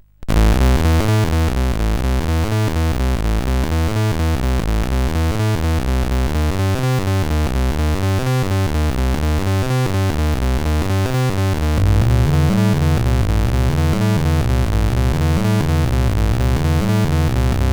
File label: Infernal 25Hz, CSG8580R5